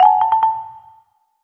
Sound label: Different Marimba Ringtone Short